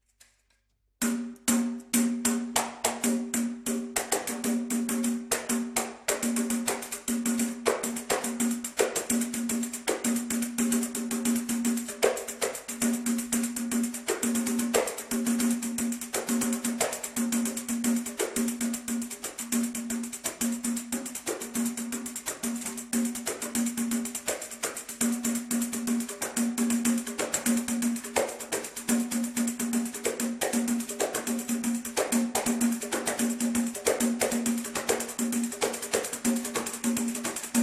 pandeiro

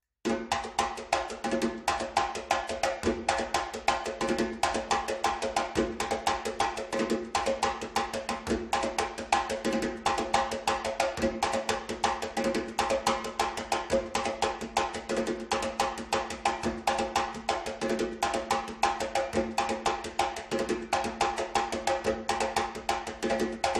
timbal

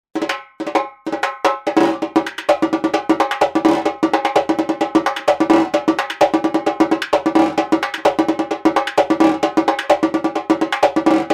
Repinique

